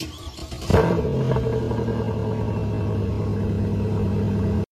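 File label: zapusk dvigatelya supercar, engine start, supercar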